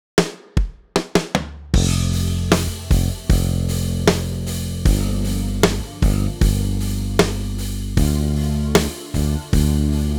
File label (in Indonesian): chord drill iringan pop, bass